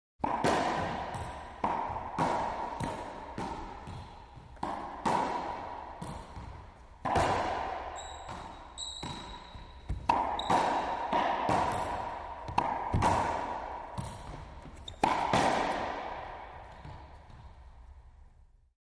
Racquetball Volley, With Reverberant Ball Hits Some Foot Movement, And Sneaker Squeaks